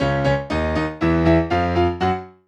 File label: Acoustic Piano